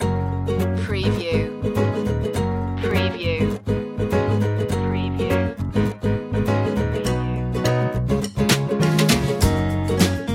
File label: ukulele commercial positive steps